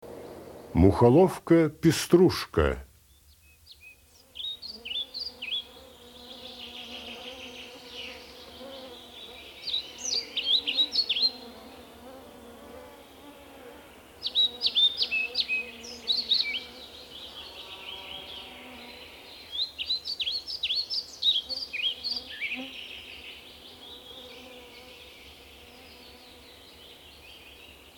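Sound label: muholovka pestrushka golos, pied flycatcher voice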